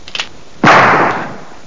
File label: Old Gunshot